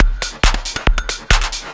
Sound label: percussion loops 138, Techno; PS-45